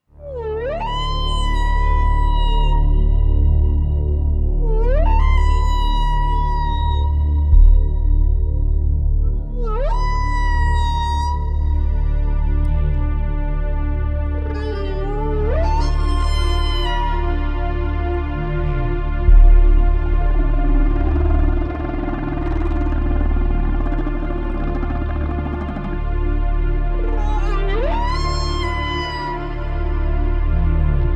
Deep Blue Sea (Meditation Whale)

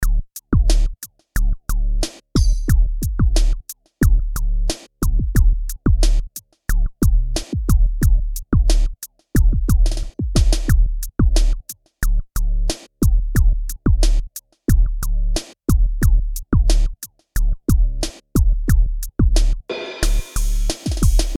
D90 Drum Bass Groove

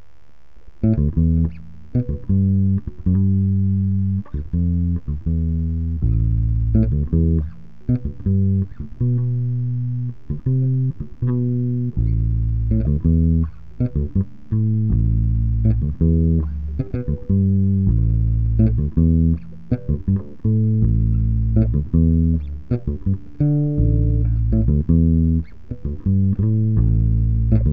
A minor Ambient Piano

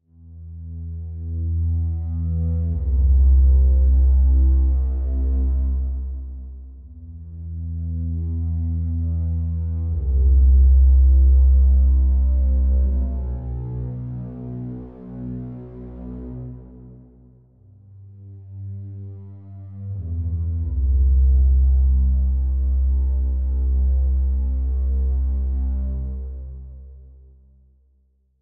drums; Volunteer Fire Brigage

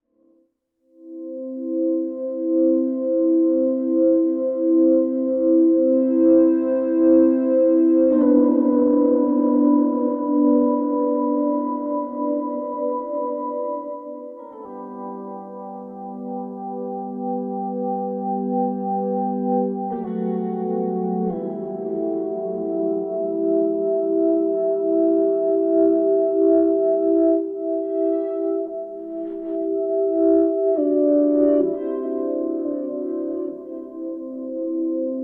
Ebow Gtr Stereo Mix

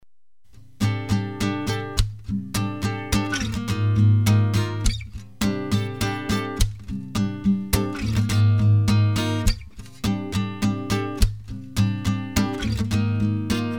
Guitar

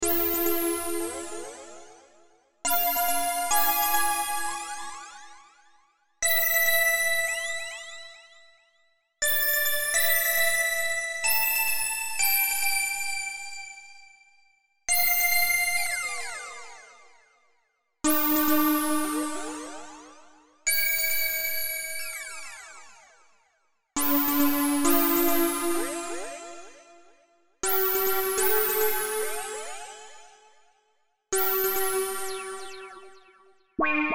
Random Synth Sounds

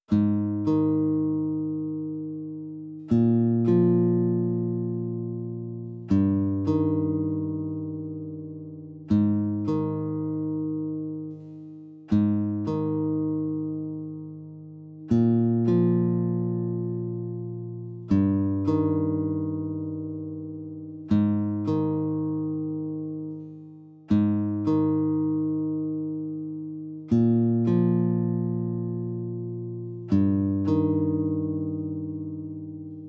sinewaves

